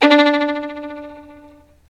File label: STR Violin, Solo Violin FX